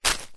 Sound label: footstep single shoe wood chippings pile; foley; zapsplat